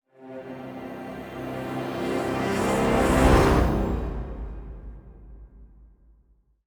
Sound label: Music Trans Loadingstart; Forza Motorsport 6 Apex